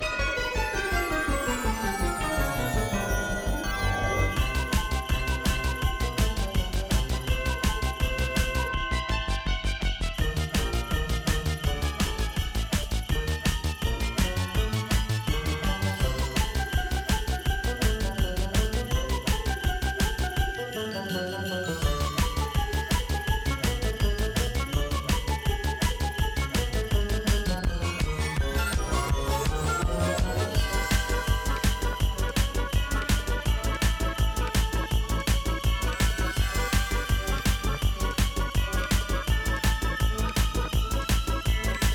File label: Winter Loop